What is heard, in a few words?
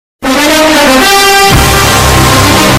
nfl bass